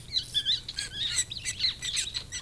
rats, dungeon; soundscapes

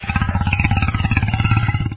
idle, doom3 pinky

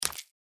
mantis foot; npc

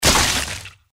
gecko death; npc

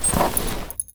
frag draw, weapons; exp frag

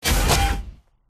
leg lift; silverback

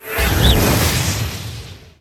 soul sword swing

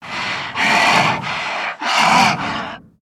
pain, zomb female; nmr

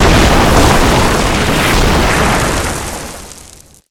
dirt kick up

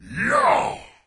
melee; doom3; commando

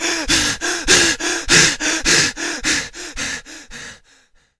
panic m

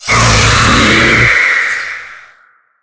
spider striking

